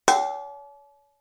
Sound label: impact metal pan med resonates